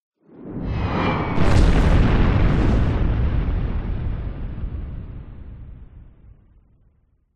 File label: warfare missile incoming whizz by then explosion